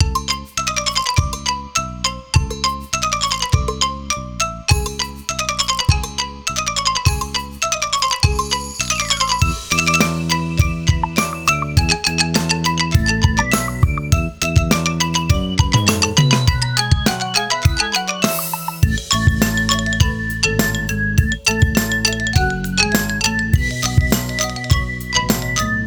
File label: instrumental